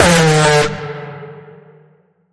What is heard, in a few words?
Bend The Alarm, D sharp